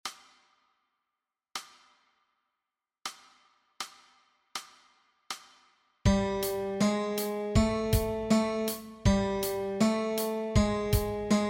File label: Guitar Exercise